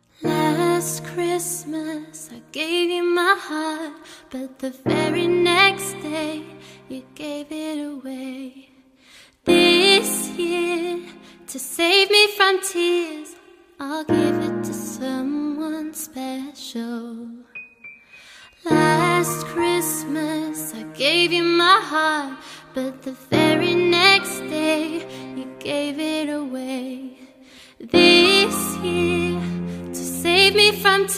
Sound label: acoustic piano cover; nhac chuong